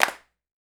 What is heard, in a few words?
CLAP R; Big Fish Audio; Ross Garfield Drums VOL-2; TOPEKA KIT